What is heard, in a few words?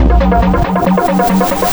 drum, 138, drum-loops, Techno; Techno ID; fatboom